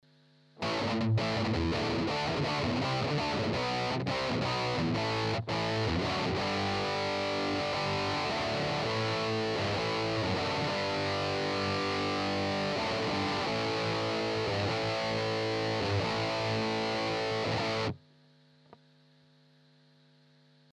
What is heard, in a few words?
EHX 5150 Rhythm Burn Strat DI Out